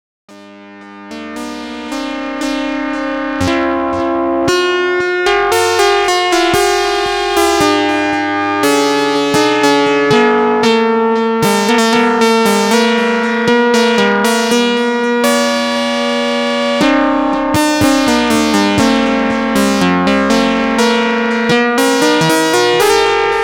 Dramatic parafonic; Goodbye

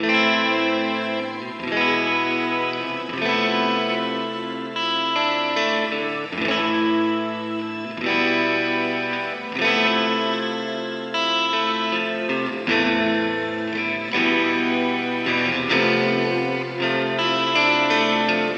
Nux MG-30 Reverb Shimmer

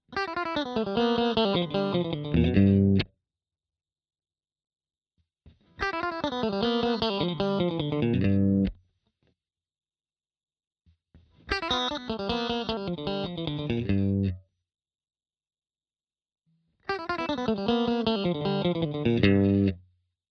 Blue Grass; ATLAS Comp Dry Studio Optical Dual

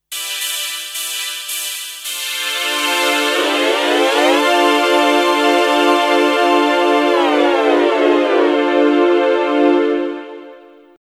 Korg i3 Synth

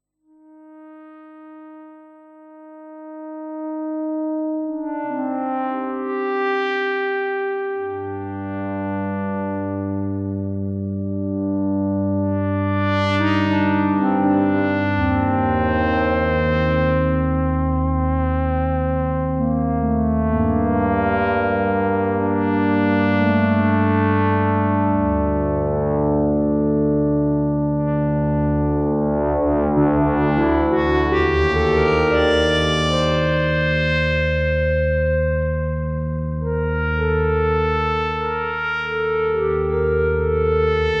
Osmose fm2612; Expressive E

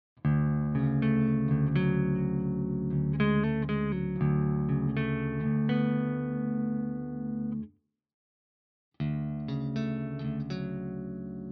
RGT1270 clean all positions Arps

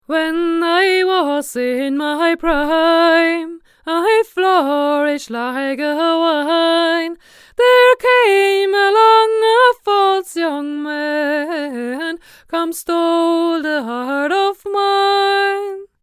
Blue BabyBottle H1 far

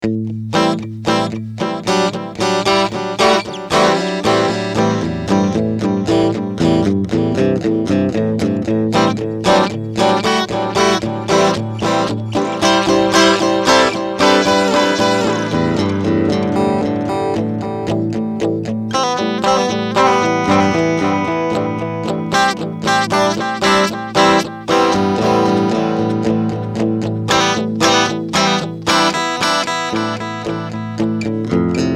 Echo

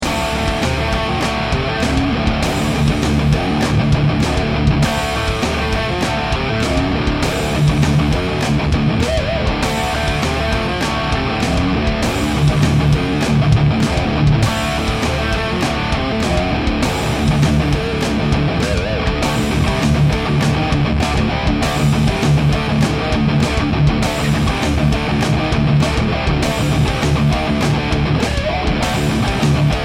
Lead

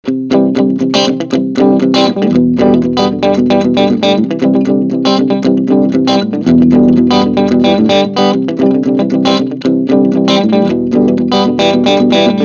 Clean Singlecoil Country

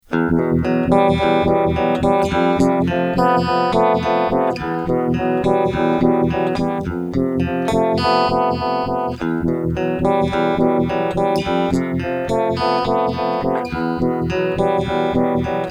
Chorus

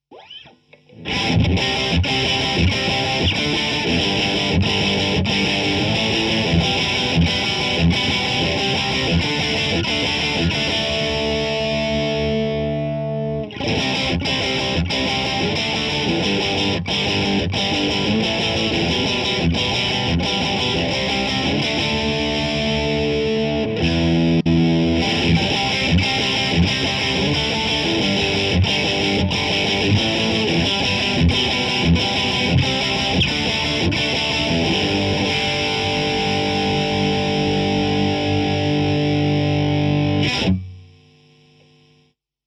Tele Classic

